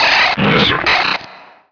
idle, enforcer; elim

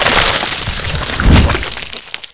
grabbed; mod quoth; vermis

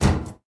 ladder, player; quakemapping; mod slipgate

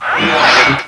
wpain; mod xj18; sentinel

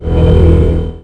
sharp; quakemapping; items

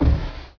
drag, steps; quakemapping

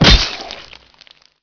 hit; mod quoth

